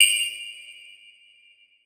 Multimedia Internet CD-Rom Flash Pop Up Short

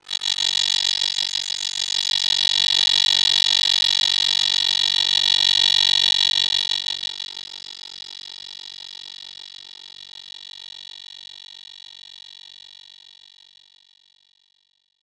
Title Transition Delay Long; Production Element Title Transition

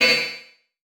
Multimedia Internet CD-Rom Flash Ding

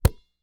Sports Basketball Grab Single

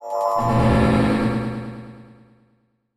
Title Transition Evolver Short; Adobe; Production Element

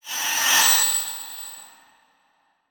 Transition Metallic Swell; Production Element

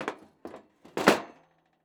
Sports Grill Metal Panel Sliding Into Grill

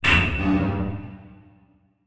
Transition Hit Impact Metal; Production Element

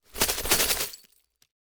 Broken Light Bulbs Plastic Tub Rattles Short; Foley

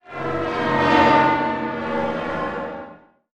Transition Whoosh Multiple; Production Element

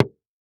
Impact Wood Impact Wood Mallet Hit Hollow Wood; Impacts

